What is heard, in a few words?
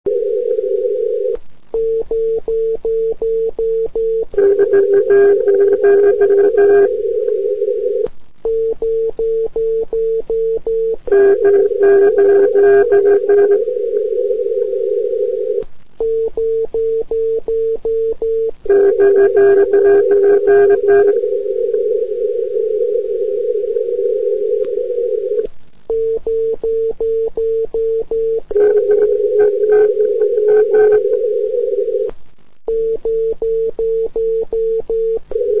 echo, EME